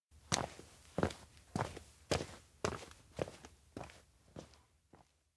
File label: steps indoor workshop walk away; aino; anemoi